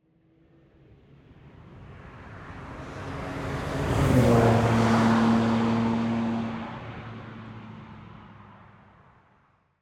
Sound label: Pass-By Sports Car Single